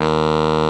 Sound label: BARI D#3; Greytsounds - Scott Peers Sound Engineering